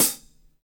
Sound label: Jazz Closed HiHat